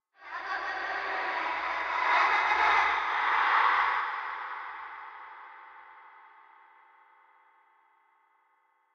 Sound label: Creepy Giggle